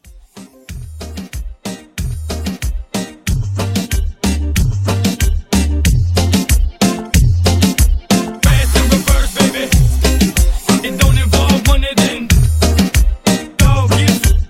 Reggaeton 93

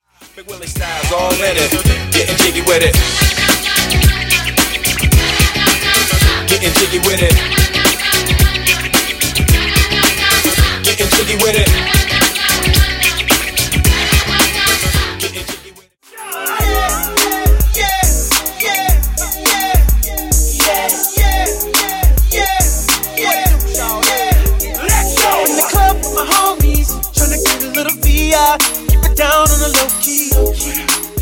Hip Hop Old School Megamix 110 87Bpm

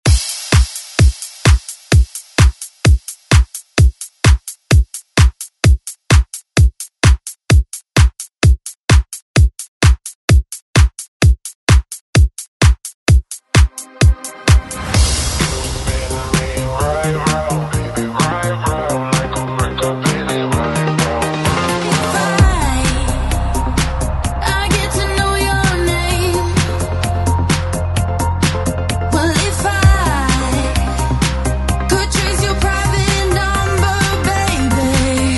ReDrum; DJ Mhark

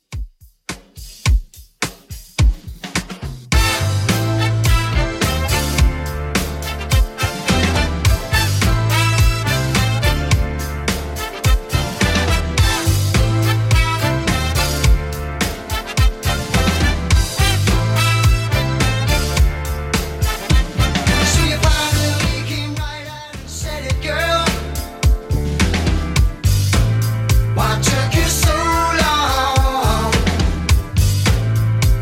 80s Redrum 106bpm

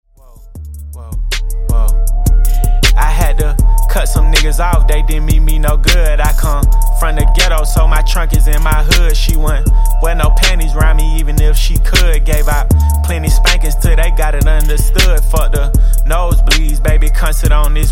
79Bpm

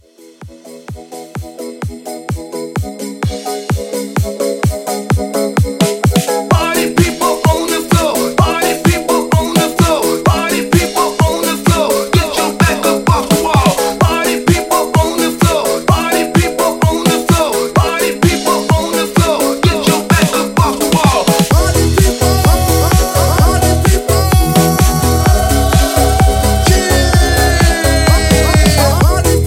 Holiday Hype

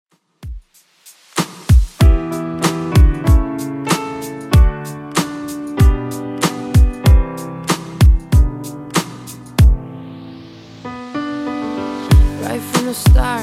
95bpm

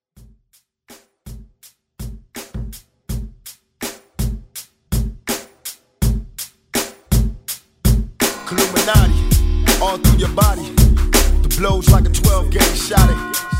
Old School Redrum

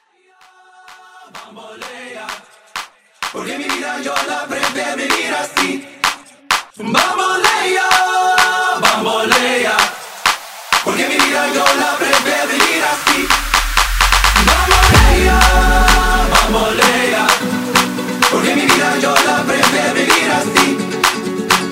Clap A Pella Intro

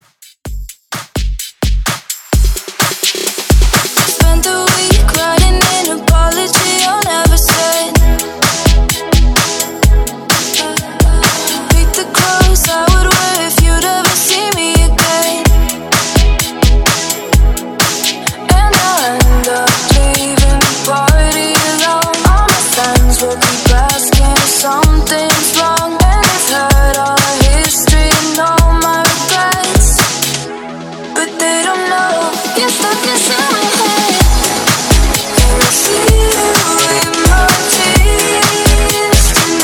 In Outro Top40 Re Drum 128 BPM

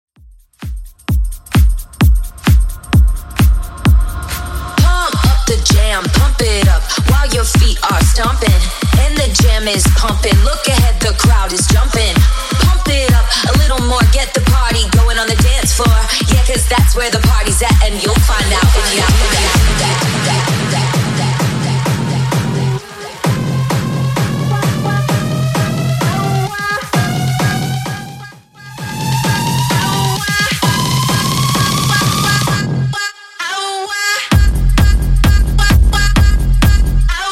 House DJ Friendly Edit 130bpm

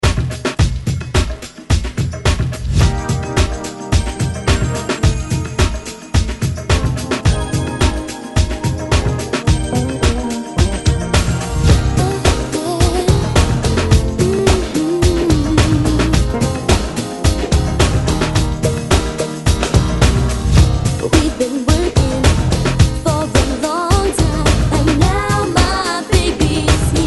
ReDrum 108 Bpm; DJDOLLS

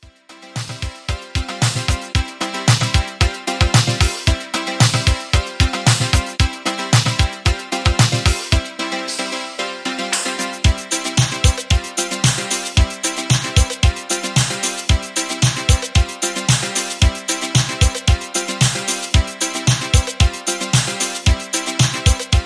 80s Dance Redrum 113Bpm

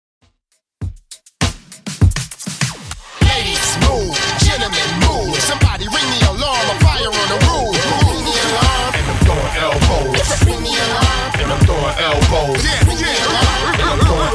Throwback Rnb Redrum 100bpm

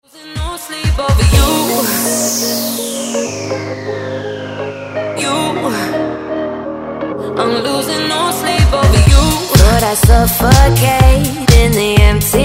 House Redrum

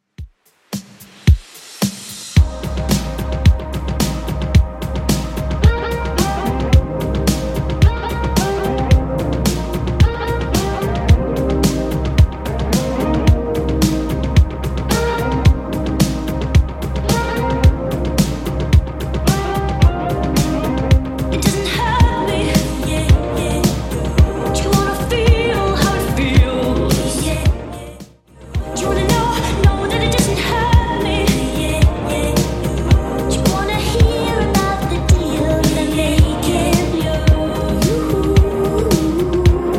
80s Redrum 110bpm

